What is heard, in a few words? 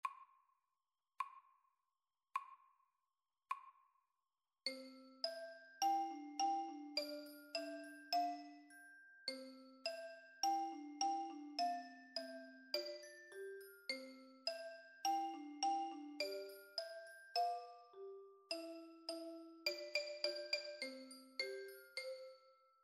instr xylophone feature